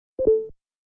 notification sound; facebook

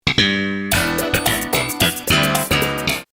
sitcom transition bass riff; seinfeld

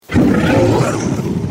scream; sharkos

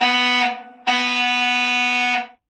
tracking truck horn; dpd

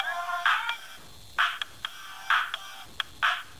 ringtone; gta iv

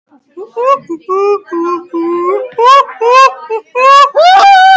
uouh ohhh

